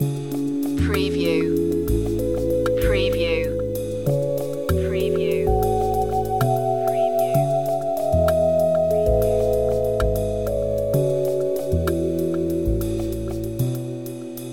loop, chill

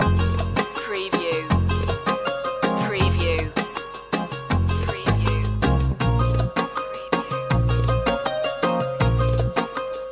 gypsy wedding loop

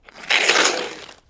Snow and Ice, Ice slide pass by

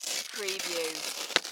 Envelope Manila Tear; Sound Effects Bible

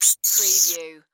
Monster Insect Scream

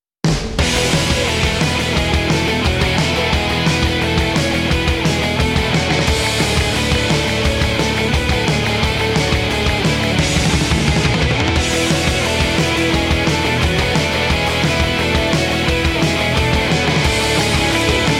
rock stage

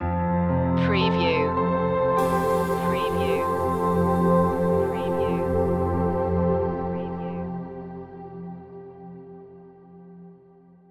mystic piano stinger

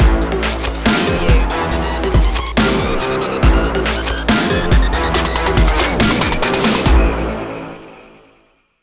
crack shot stinger no vocal fx

